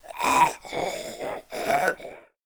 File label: idle, zombie; DayZ-Epoch; dayz sfx